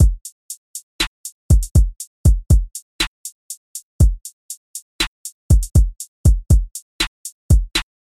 Lofi Beat

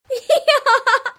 Laugh

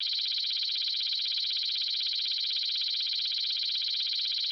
Data Read Out With On Screen Text Artificial

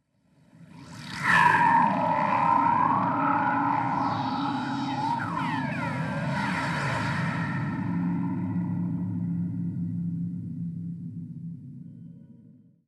Robots And Electromechanics

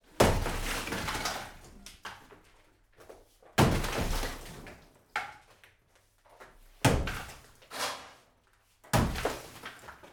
Wall Destruction

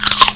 Bite Mordisco

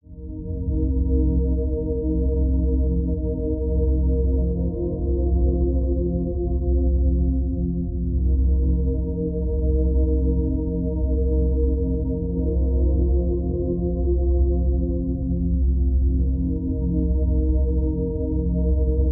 Ambient Loop

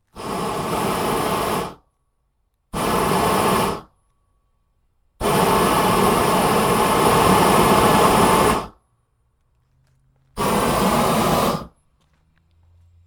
Flame Thrower Varying Power

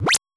Pick

